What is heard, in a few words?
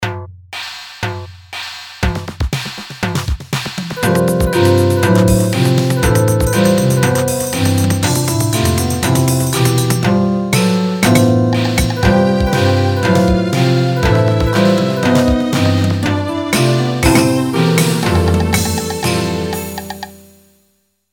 ringtone